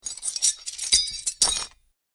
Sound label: Metal Chain Sounds; Metal Sound Effects; Chains Sound Effect - Clink, Clatter, Clang, And Clink Are The Sounds That These Chains Make.